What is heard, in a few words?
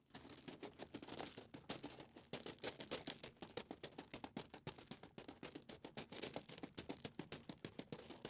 Running On Small Gravel